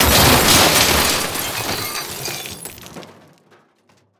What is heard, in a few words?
Crash Big Debris Glass Metal Wood; crashes; AdobeCC